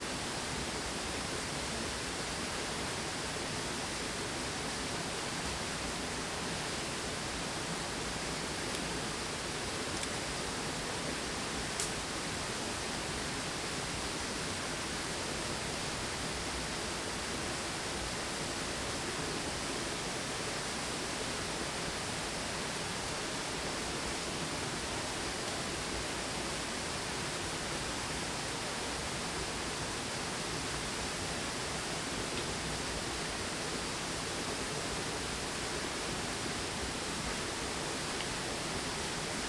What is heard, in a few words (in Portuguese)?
Cachoeira com alguns estalos de madeira; agua